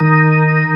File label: full slow, ToneWheel Organ; E-MU Formula 4000 Series Vol. 3; Analog Odyssey